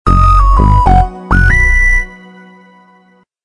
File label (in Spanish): tono de unefon